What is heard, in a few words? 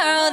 vocal